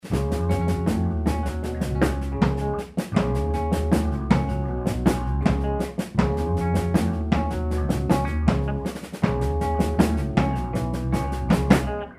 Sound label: basa riff loop